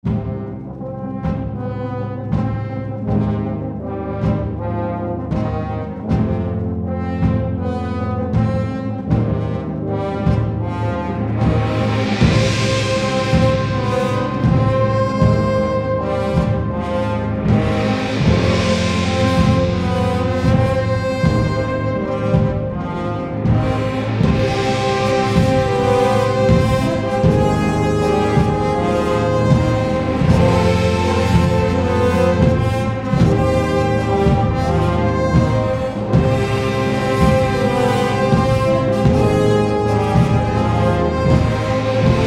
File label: Brass; Lake Brass; Man of Steel